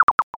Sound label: dice